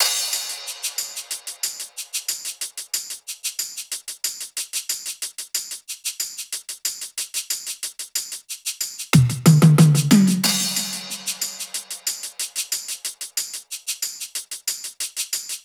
perc, Drum Loops, Top Loops, 92; SD MIDNIGHT Sample Pack; Slate

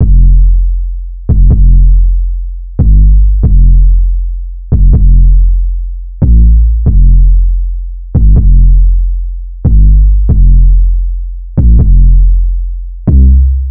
808 loop 140 F; Slate Digital BASSHOLE Sample Pack; 808 Loops

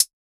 hat simp, Closed Hat; Hip-Hop; Drum One Shots; SD CAICOS Hip-Hop Sample Pack; Slate

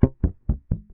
Bass Rake, Electric Bass One Shots; Slate Digital BASSHOLE Sample Pack

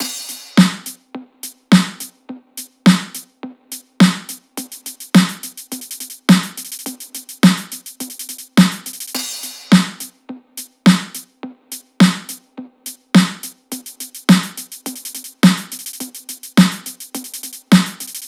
top 105, Drum Loops; SD MIDNIGHT Sample Pack; Slate

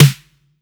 909 Snare; Slate; Vintage Drum Machines Volume 3 909 Edition

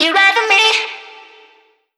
Vocal Sample Pack Phrases Am; Slate Digital Omnivox Vocal Sample Pack

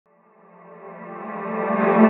vocal reverse F; SD AURORA Sample Pack; Slate

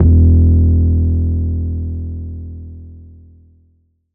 808 Bass Open E3; Slate 808 Deep Dive; SD Signature 808s

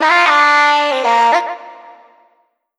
phrase Bb, Vocal; Slate Digital Omnivox Vocal Sample Pack

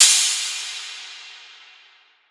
cymbal dark plate; Drum One Shots; SD MIDNIGHT Sample Pack; Slate; Cymbals

